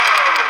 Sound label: minigun spindown; Modern Warfare